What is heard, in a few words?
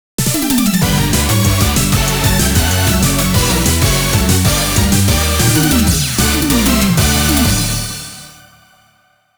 Perfect Victory; Legend of Dark Witch the Episode 3 Wisdom and Lunacy